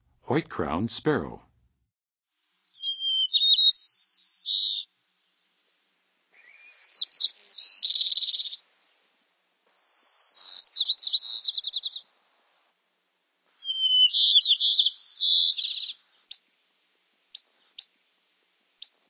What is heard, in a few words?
White-crowned Sparrow, Songs of Birds of North America; Western USA; Western USA Songbirds